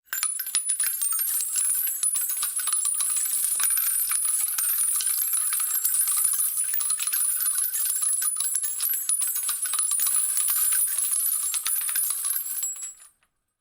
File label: medium casings on cement